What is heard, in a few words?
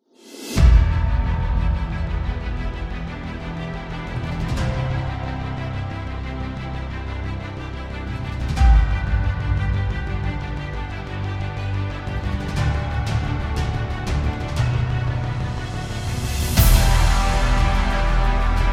Epic Cinematic Inspiration Trailer